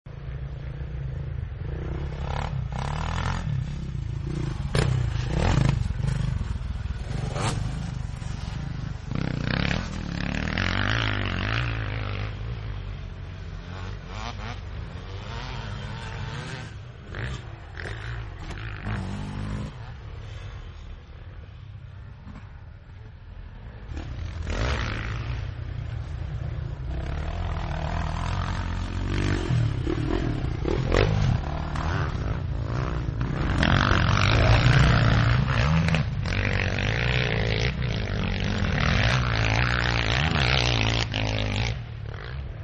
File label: MotoX Ambience, Motorcycles; Vehicles